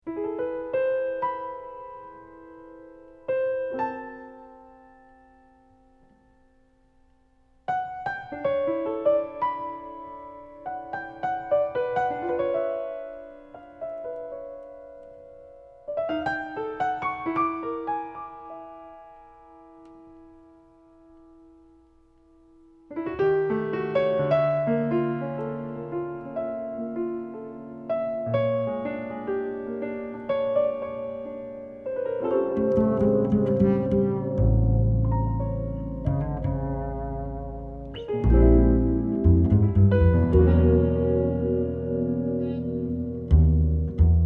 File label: jazz combine; Matlock